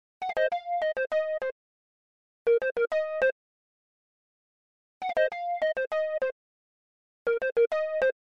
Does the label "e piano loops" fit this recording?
Yes